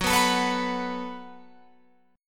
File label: Gb Minor Double Flat 5th, Guitar, Open F; Gbmbb5 strummed; 18,18,21,18,21,18